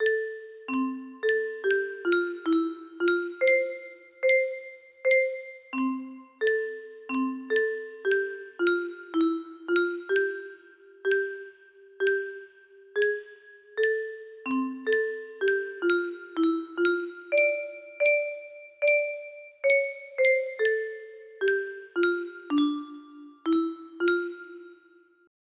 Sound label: music box melody